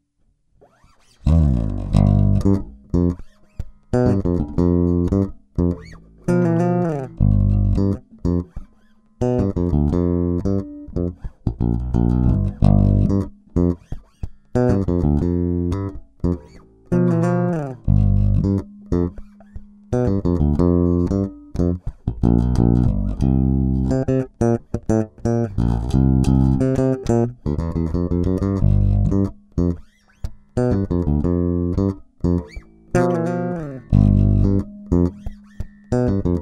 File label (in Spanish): bajo 2 dedos